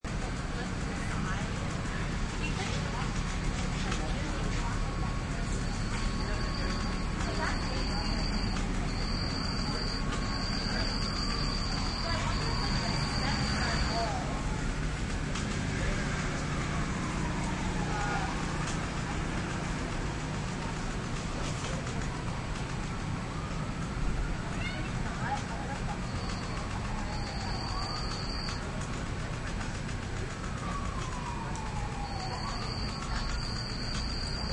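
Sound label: tornado day, sirens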